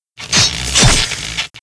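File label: mob raid attack; Monster; 레이드; 야투공야손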